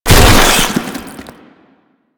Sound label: Maggot Pain; Enemies; Vocals; Warframe; Proto Infested